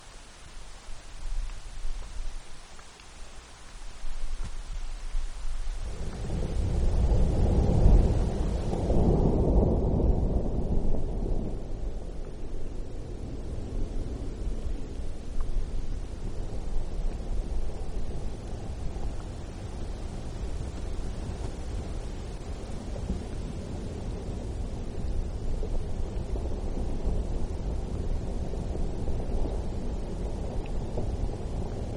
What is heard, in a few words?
Avalanche With Two Bursts and Long Washout; alaska acoustic highlights; GEOPHONY